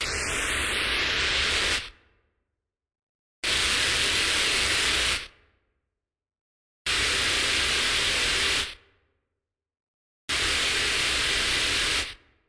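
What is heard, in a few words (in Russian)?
синтезированная вода текущая из крана или водопад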